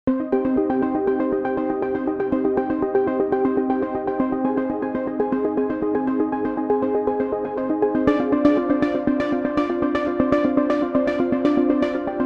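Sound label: Melotron Chord Arp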